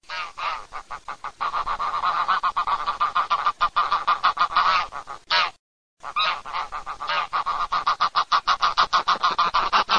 OCA SELVATICA

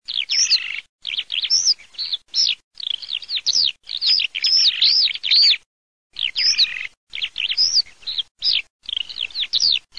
allodola mix, birdsound

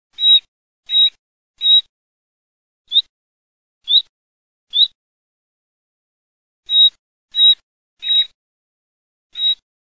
usignolo

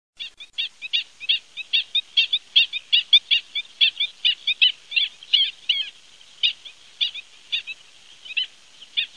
CAVALIERE D ITALIA

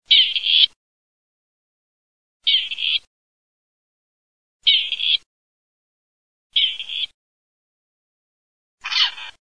STARNA

